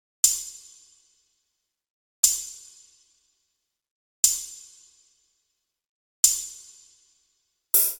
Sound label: drama 120 hihat